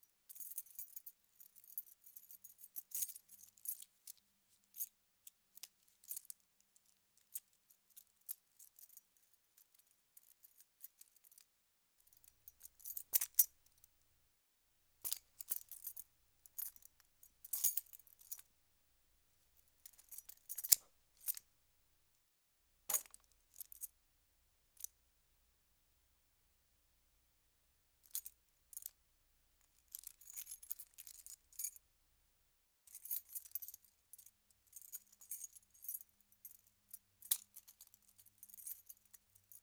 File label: Key Movement; Fairlight Sound Lib